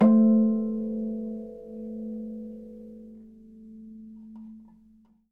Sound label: pair vanish; quell zen switch gamerip 2020